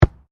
RUGBY BALL KICK; Various rugby sounds; Miscellaneous Sports; Sound Effects:Sports

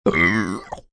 Burp Odd, Burp, sounds like a seal (human voice); Burping Sound Effects

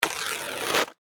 Foley Cloth Rip Tear Fabric; Ripping Sound Effects; Ripping (Sound Effects:Objects)

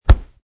Punch

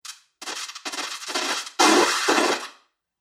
Explosive White Noise; Noise Music

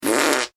human fart; McKinney Sound

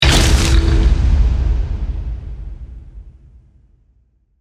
Hit Crunch Dark, Accent, Transition; Hit, Crunch, Dark, Production Element, Imaging Element; BlastWave

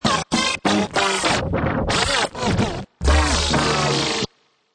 Scratch Hits 100, Vinyl Scratch Hits 100 bpm; Dj Sound Effects

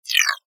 Whoosh Flange; Whooshes; Multimedia; Whoosh, Tonal, High, Short, Production Element, Imaging Element, Accent, Transition; Blastwave

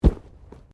Body movement Fall Medium

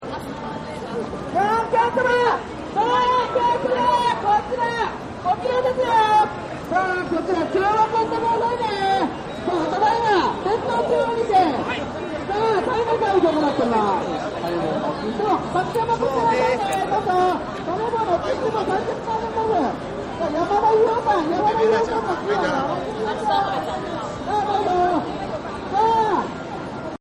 Street Ambience Tokyo; Cities; Backgrounds

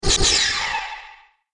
Ion Blaster Twin Lasers, laser gun

